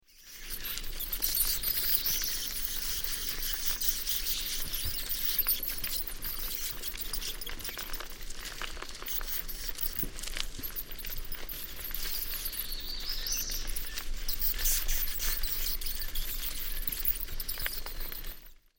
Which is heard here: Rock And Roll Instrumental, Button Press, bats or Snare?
bats